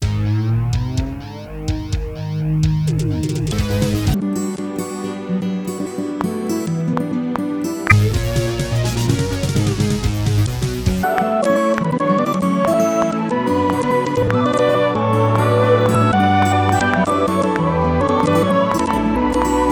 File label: Boss Music, midi